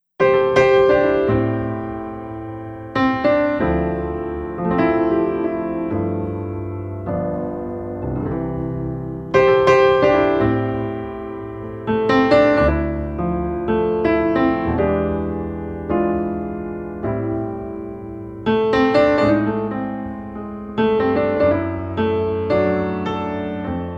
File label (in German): telefonansagen musik piano slow